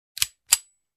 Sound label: Pistol cocking sound